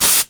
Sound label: Aerosol Can, Bathroom; 1001 Sound Effects